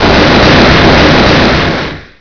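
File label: explosion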